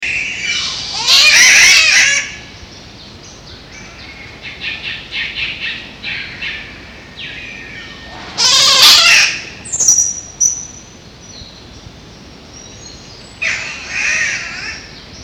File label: spotted catbird